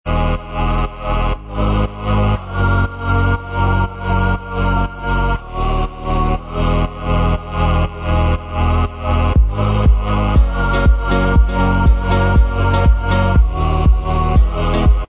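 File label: instrumental, backing track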